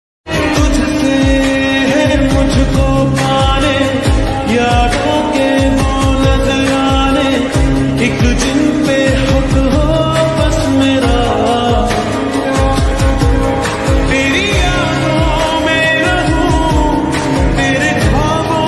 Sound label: hindi best trending ringtone